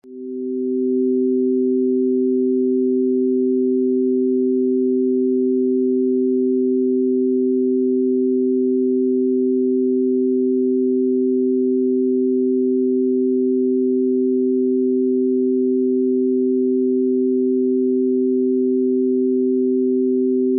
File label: 256Hz 384Hz; binaural beats